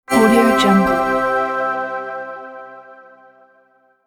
winner

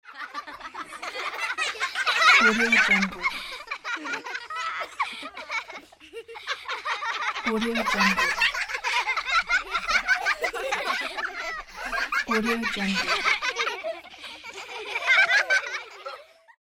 children laugh; tr sound

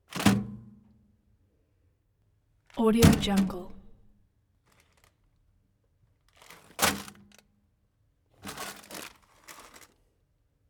vending machine interior

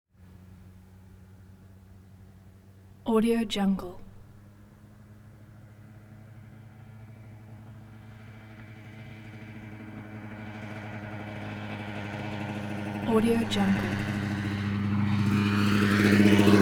1991 kawasaki 200 motorcycle dirt bike

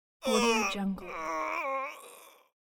male groan pain

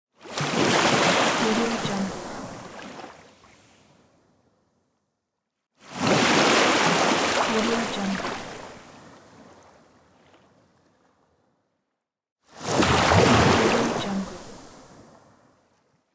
sea wave

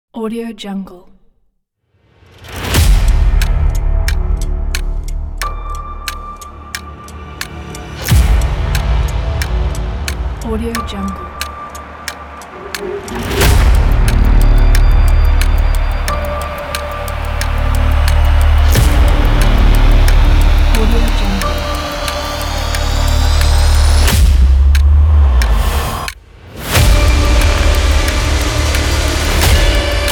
countdown trailer

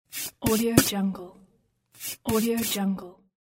removing the remnants of the eraser

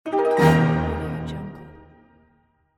game reward

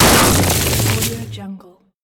car crashes into brick wall

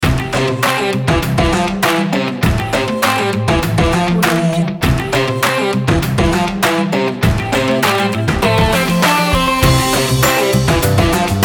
upbeat indie funk pack; snowmusicstudio

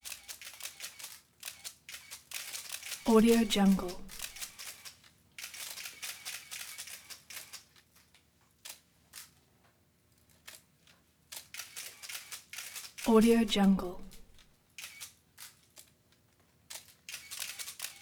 cameras clicking motor drives professional dslr

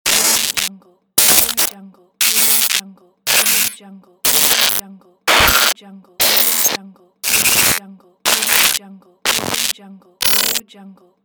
short glitch digital interference

